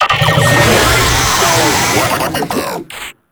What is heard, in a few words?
Attack, Vocals; Warframe; Proto Infested; Babau